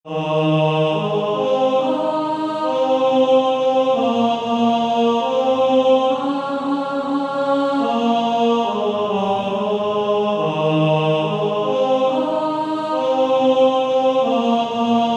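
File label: Male Choir, tenor 2; On Christ the Solid Rock I Stand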